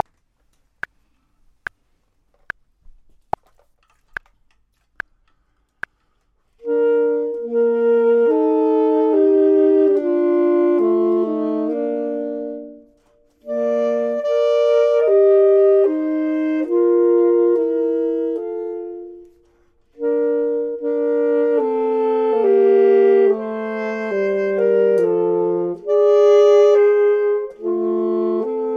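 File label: both, Saxophone and Clarinet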